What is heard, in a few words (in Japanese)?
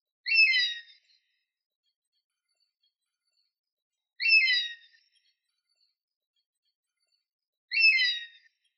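hachikuma, honey buzzard; okayama; koe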